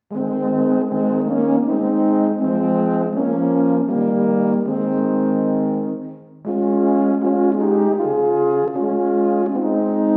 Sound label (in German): GLORIA Untersbergmesse